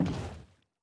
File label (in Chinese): 船在陆地划桨声